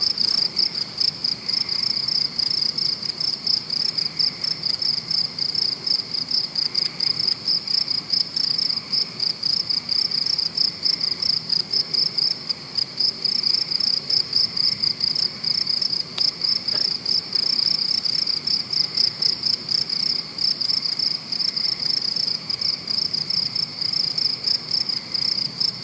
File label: crickets; Crickets beside graveyard, Acton MA